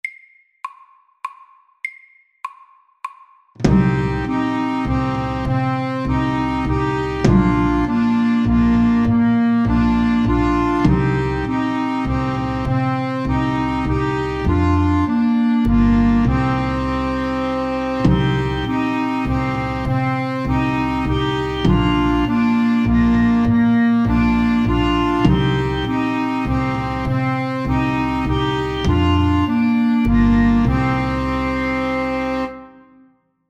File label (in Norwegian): Sjanti SLOW 100